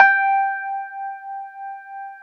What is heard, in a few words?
Keyboards Old School, G4; USB Soundscan vol.09; AKAI